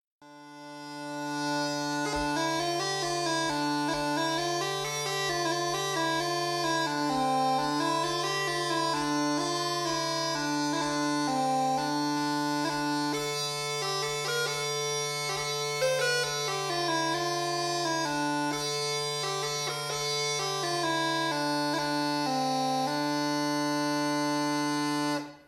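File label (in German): Hümmelchen D Mittelalter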